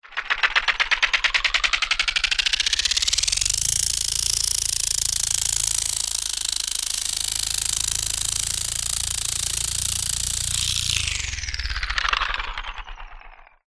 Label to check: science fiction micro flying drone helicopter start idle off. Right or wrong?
Right